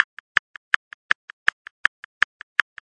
162 klip klap, drum loop; 161 to 180 bpm

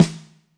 real soft snare; REAL LIVE KITS; A Real Freakin Drum Kit

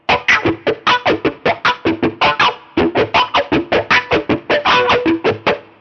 slow wah rythm, guitar

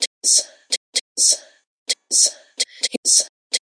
tss loop; REMIXABLE COLLECTIONS; Ze Franks Pain Pack

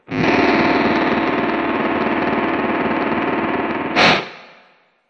very floppy synth string, guitar noizes

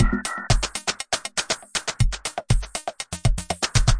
DRUM LOOPS and BREAKS, 120 bpm; wave your feelers in the air

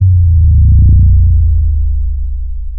so low it hurts, bass

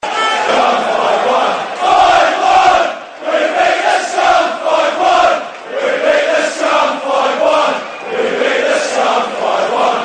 rowdy crowd singing, MALE SINGING; VOCALS and SPOKEN WORD

